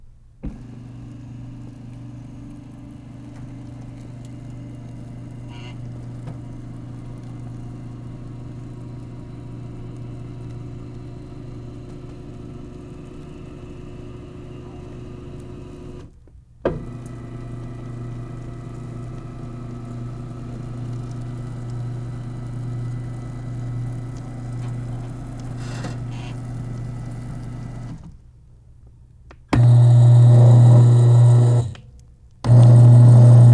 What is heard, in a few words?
Dentist Chair Hydraulics